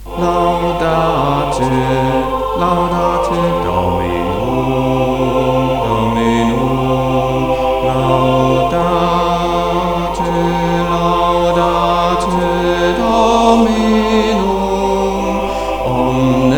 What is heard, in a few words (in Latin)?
Laudate Dominum Bass; Quempaschor